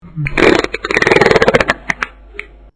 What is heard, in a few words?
fart